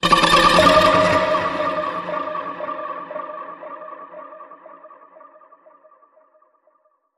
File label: Cosmic Space Flute